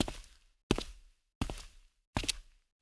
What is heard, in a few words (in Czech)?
kroky; Mafie 1